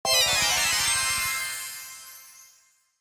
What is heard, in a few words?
Slot machine casino bonus round intro